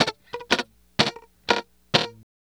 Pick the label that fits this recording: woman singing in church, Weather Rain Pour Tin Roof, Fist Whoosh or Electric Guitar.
Electric Guitar